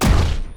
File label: Explosion; unity; 2.5D Engine